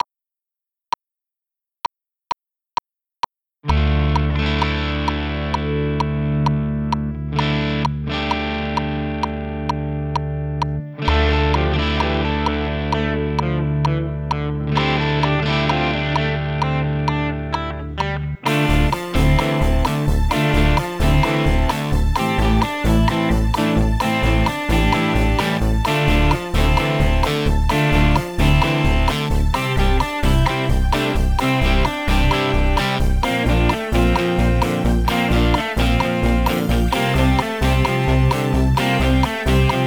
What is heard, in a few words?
Post Disco Guitar